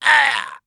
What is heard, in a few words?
VO Death; VIP; Aragami